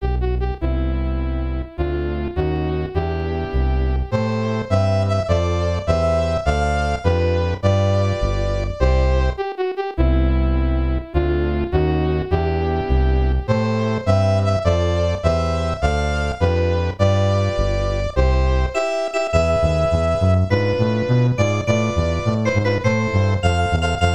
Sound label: Ending Music; SMAS; Super Mario Bros.,Lost Level